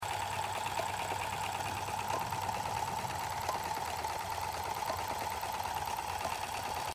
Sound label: Log runing